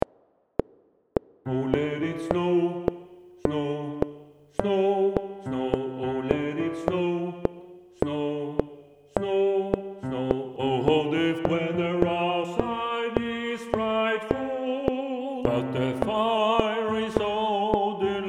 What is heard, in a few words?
BASS CLICK